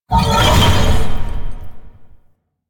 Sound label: Horse Glide Wing Flap Flight Boost; Vehicles; Duviri